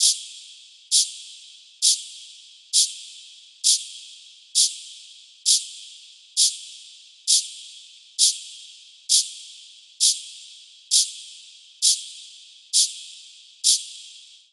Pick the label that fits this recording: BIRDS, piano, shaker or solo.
shaker